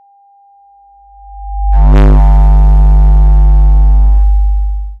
sonic bomb, futuristic weapons sound effects; maze martin

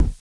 Retro Bass Drum; Weapons Sound Effects

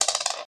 item coins, Magic And Spell Sound Effects

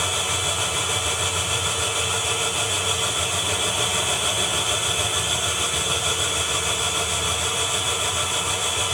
Futuristic Weapons Sound Effects

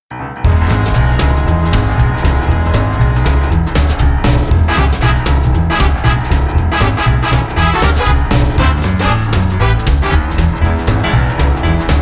Big Swing Band; Jazz

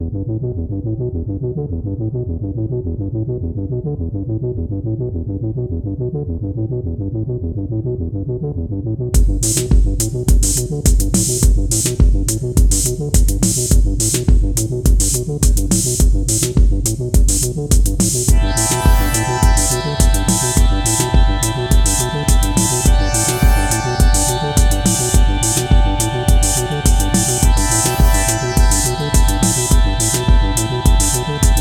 Haunted Forest

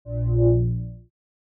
space shield sounds

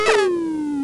Explosion, Retro Game Weapons Sound Effects

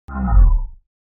creature die

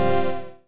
Retro Game Weapons Sound Effects

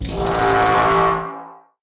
time freeze, Magic And Spell Sound Effects

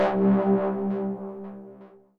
anchor action

